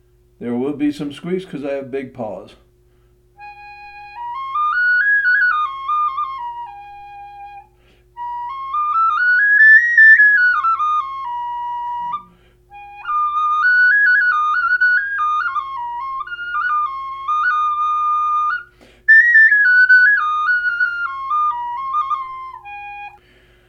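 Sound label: single Ab5, Native Style Flutes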